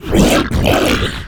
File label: gurgbarf